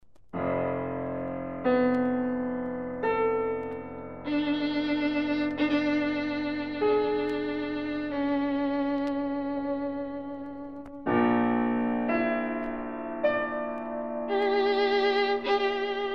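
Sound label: violin sonata; scharwenka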